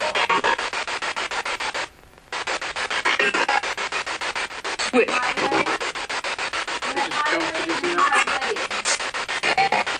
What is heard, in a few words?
Spirit Box; Hallway; private residence freedom road apartment investigation return